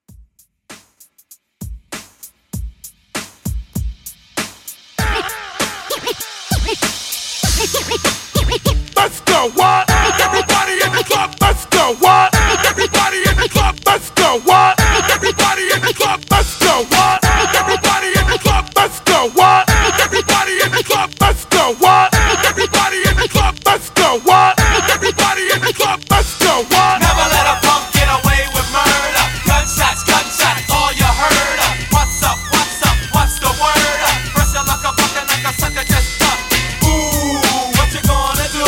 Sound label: 90s HipHop Hype intro 98Bpm